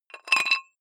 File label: dumbbell thread take off weight quick, fitnessstudio